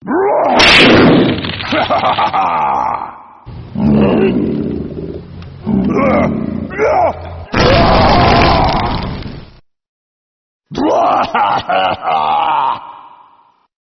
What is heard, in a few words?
farting; bowser